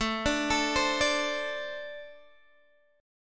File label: A Minor Double Flat 5th Guitar Open G